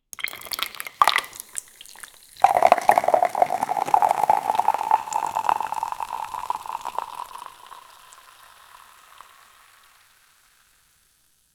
soda can pouring

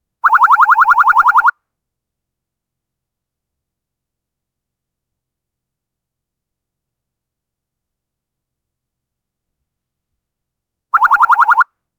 single shoot kar98 like

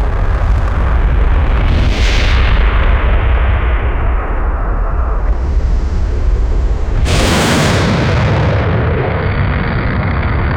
space ship burning on the

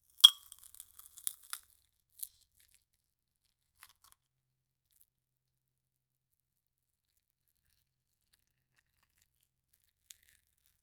cutting butter slimy wet

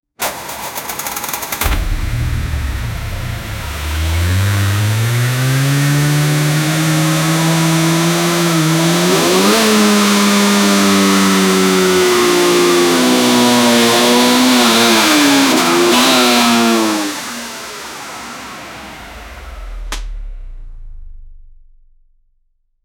v8, engine

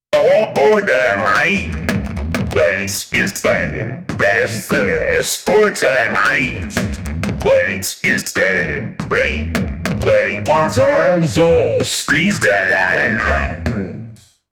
dance music with announce

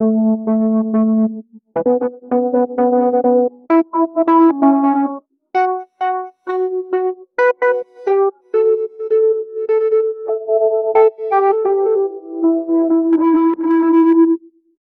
slow electronic music

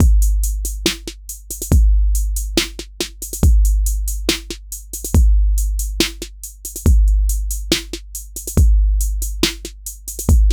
roland 808 drum

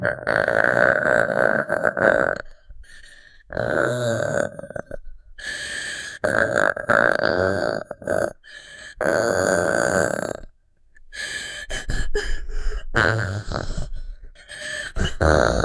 gassy stomach growling

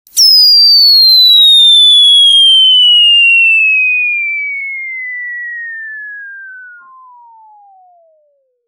a plasma weapon firing with a high pitched whine

